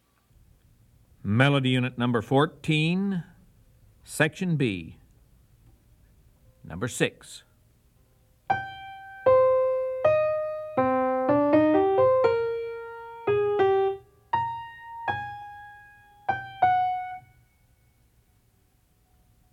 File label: melody, ear training recordings